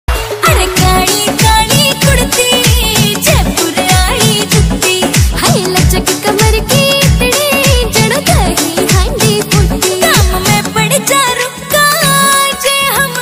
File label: haryanvi ringtone